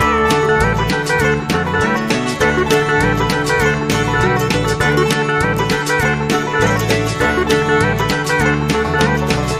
Hillbilly Bootstomp

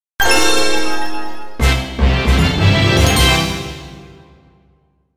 Coin Start fanfare; Trouble Witches; Credit -Witches' Time

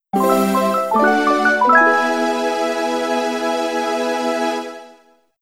Sting; Art Academy First Second Semester

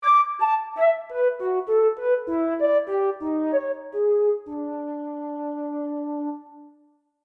flute; undead overlord linux macos windows gamerip 2014

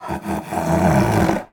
growl

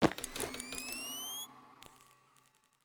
VR On; PCBS; Aorus

